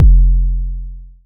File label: analog tonal, Kickbank